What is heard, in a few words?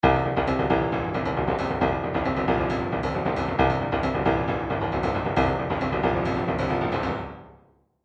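trance midi loops bass filter; newtronic trance constuction kit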